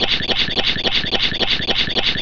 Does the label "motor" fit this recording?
Yes